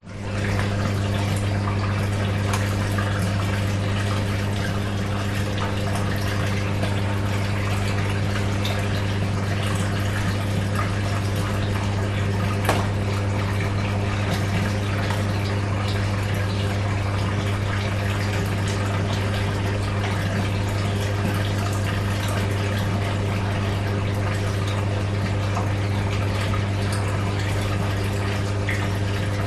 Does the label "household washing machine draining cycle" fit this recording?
Yes